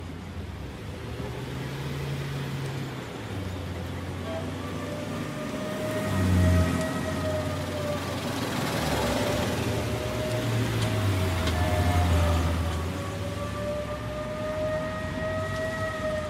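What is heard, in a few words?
old town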